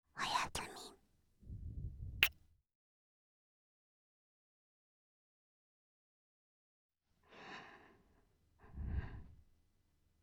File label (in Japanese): 寝息; SEあり